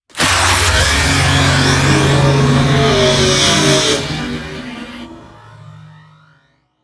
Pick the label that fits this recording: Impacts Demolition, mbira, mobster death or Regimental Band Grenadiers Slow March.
Impacts Demolition